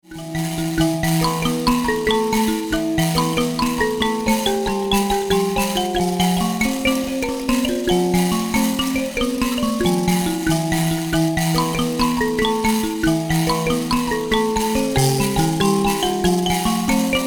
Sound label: mbira